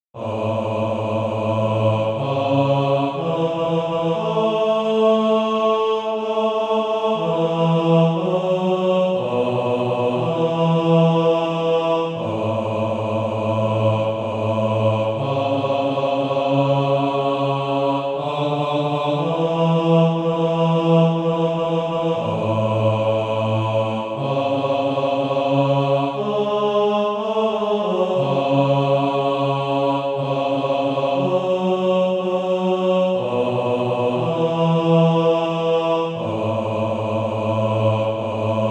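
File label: bass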